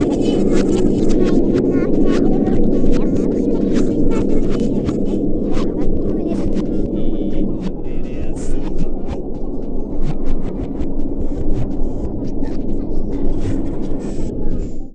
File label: FX; E-Lab; X Static Goldmine 2; akai